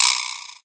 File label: jawbone